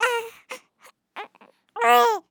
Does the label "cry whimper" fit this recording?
Yes